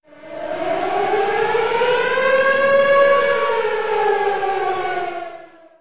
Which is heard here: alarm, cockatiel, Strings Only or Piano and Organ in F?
alarm